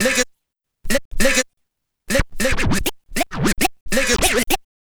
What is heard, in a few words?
Scratch; Sound FX